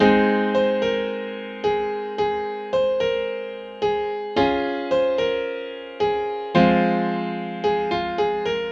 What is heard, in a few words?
Grand Piano 110 BPM (G# Major); Fearless; Ghosthack; Free Guitar And Piano Samples